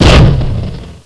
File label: buffalo shoot, weapons; wanted